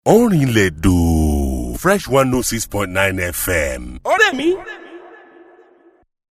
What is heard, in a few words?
Jingles; Music Studio in Manchester